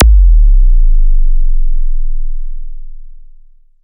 sub zap; Best Service Alpha Dance vol.2; ZAPS+THUMPS

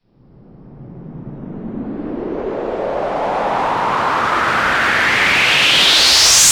FX Sweep Up; Vengeance - Essential Clubsounds Vol.3; Sweeps Up

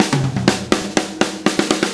OH BABY

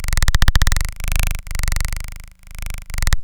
Vactrol Acid; 300 Drum Machines; DevSnd

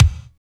KICK, Tekno Industrial; East West

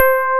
BRIGHT PF C4

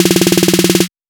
roll, snare; replica rythem king; M8

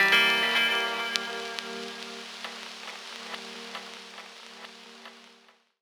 GTR LOST FX, Gbm; Rawcutz - Nu Forms

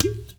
Niger udu; Spectrasonics; Heart of Africa 1

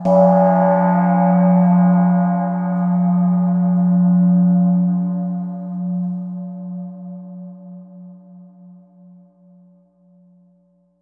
Gongscape; Sonic Foundry (Sony Creative Software); Continental Drift Aftershocks

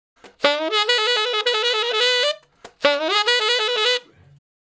Ten Sax Straight (B), R&B; Solos

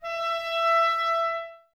clarinets, Wood Winds

